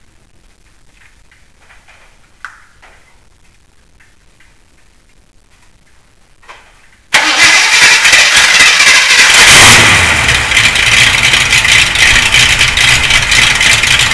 jeep startup

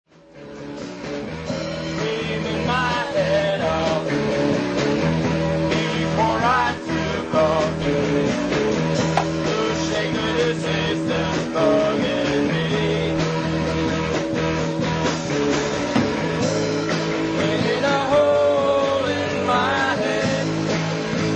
sneeze

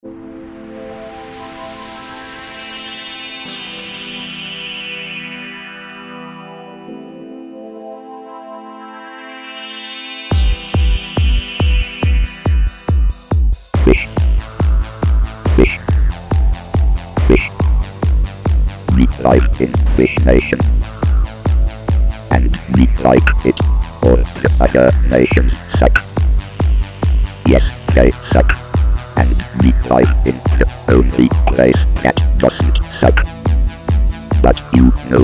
tech

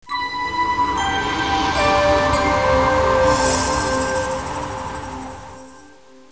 Startup

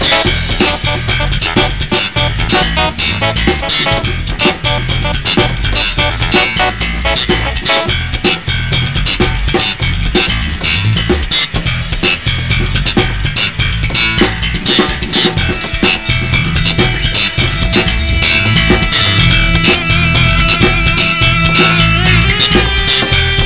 funky synth

